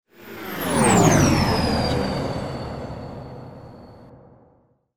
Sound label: space rocket flyby